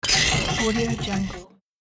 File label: curtains opening